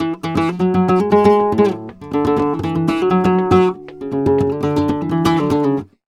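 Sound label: Flamenco Guitar phrase c#; Best Service ProSamples vol.46 - Flamenco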